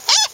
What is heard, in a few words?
pig high; 高い声